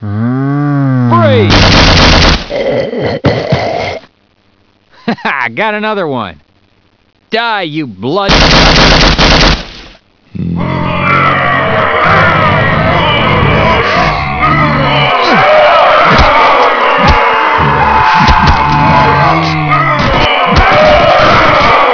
zombie attack; svencoop; revil

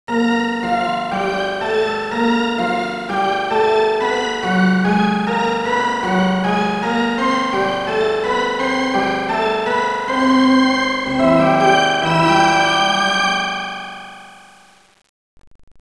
organ; svencoop; toonrun

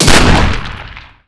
fire; svencoop; russian sounds

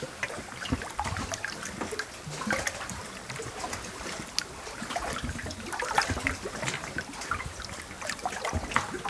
camp water; cstrike

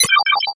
mine step; weapons

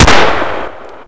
sig550, weapons; brainbread

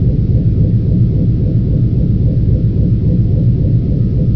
processing, ambience

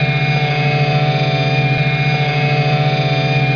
cryo mid; zen

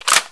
clip out, m4

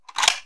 pull; brainbread

